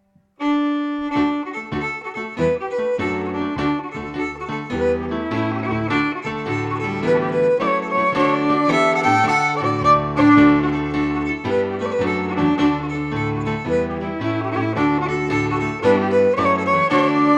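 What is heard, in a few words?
Jig, Scottish Fiddling; Morrison 1797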